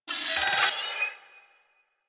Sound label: Turret Harness Movement; Warframe; DE; Vehicles; RailJack; RailJackTurretHarness